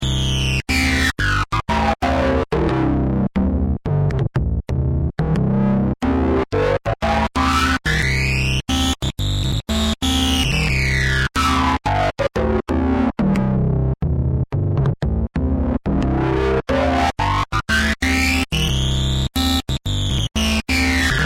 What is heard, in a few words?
wasp bass